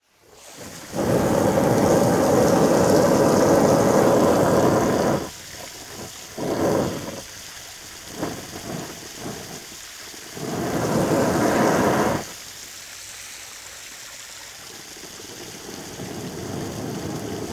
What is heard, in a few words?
Liquid Water Water Spraying Plastic Pool